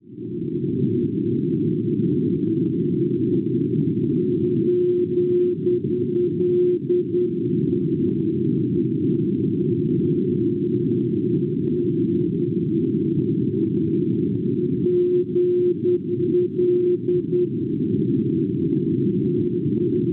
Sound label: NDB Nantes Francia 369 kHz; GL; Schwarzsee